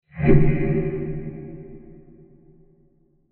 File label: Science Fiction Spaceship Transition Short; Sci-Fi Blobb Impact; Digital Sound Effects; Digital (Sound Effects:Impacts)